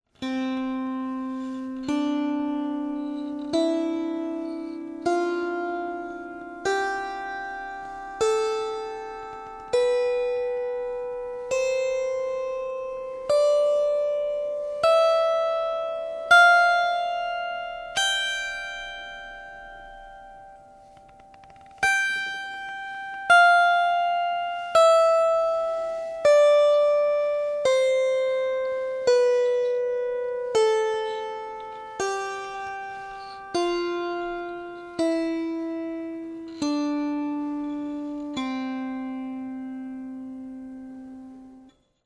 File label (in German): Kinderleier Esche Choroi